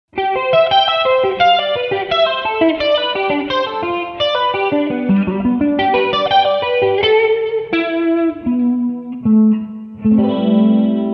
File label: Clean Picking